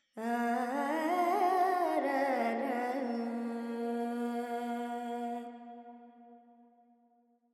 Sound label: Verb Dorian, Vocals, Carnatic Female, Alaps, 70 G